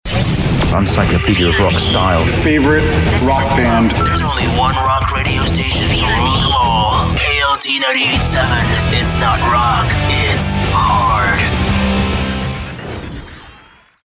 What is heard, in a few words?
ROCK Radio